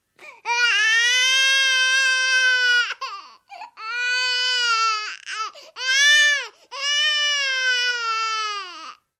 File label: crying baby, baby cry